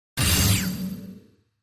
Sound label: Space Hit Laser Fire M